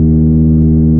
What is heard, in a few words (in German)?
kurzer ton